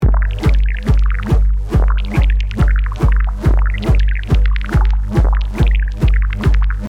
E Drum rave beat loop

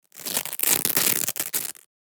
Velcro strap manipulation

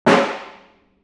Snare single; drums and percussion

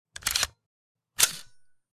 HK416 reload

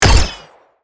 Sci fi rifle futuristic single shot

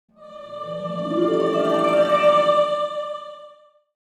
Mobile game holy level up

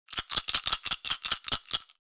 Dice shaking fast board game

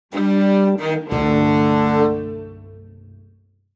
Mobile game nordic vikings fail lose

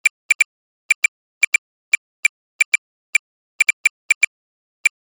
Military radar antenna signal beeps; Military Equipment

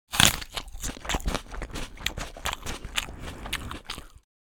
Apple eating fruit chewing

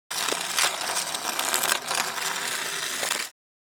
Ice hockey stick scrape on ice

